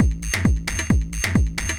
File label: Tech loop